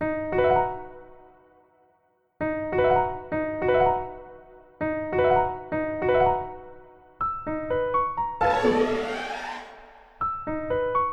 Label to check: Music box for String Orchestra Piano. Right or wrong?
Right